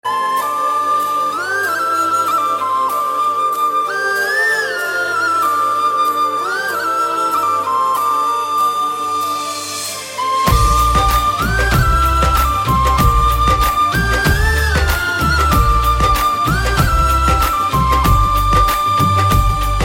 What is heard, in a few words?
bansuri ringtone